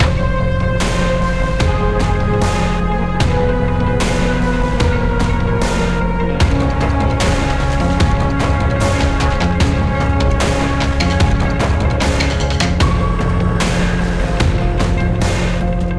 boss battle; Gl Rook Bossbattle; Griftlands

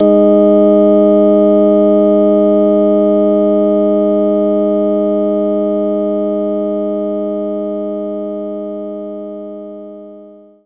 Hammond Organ; knights try

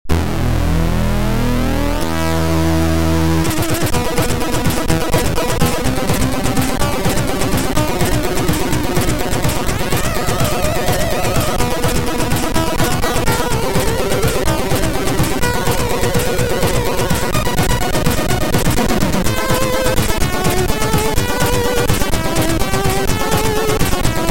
Speed Metal Bossfight; Metal Warrior 2; Metal Warrior Trilogy

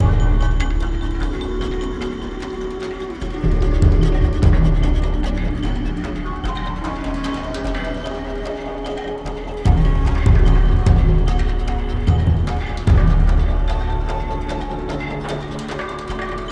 Siege Fight Medium; Eden Rising

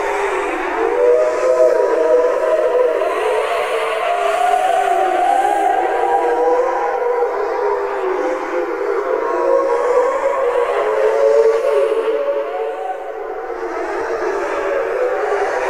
Wailing Ghosts

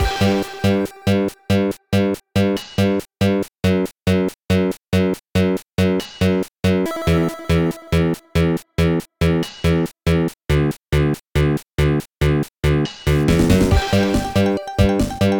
Instrumental; Friday Night Funkin Funkin for Hire ost mod windows gamerip 2022; Bet On Tails

